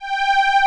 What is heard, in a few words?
ST SA, SYNTHESIZER ANA STRINGS; AKAI S6000 CD-ROM - Volume 1